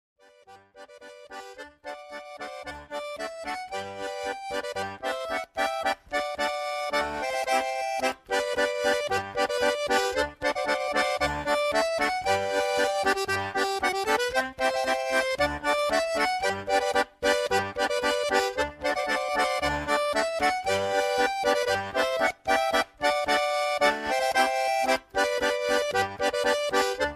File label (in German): Schwyzerörgeli